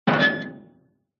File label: impact metal huge heavy hit with scrape